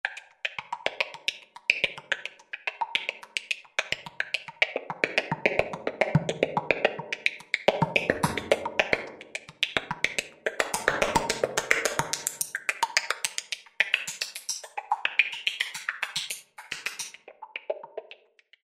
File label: Tap Dance, Roland V-Synth; Factory Patches